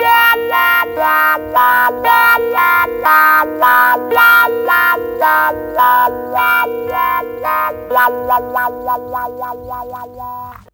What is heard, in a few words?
gtr, guitar